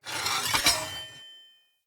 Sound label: Knight Axe Equip; Weapons; Enemy; Axes; Snc Knight Axe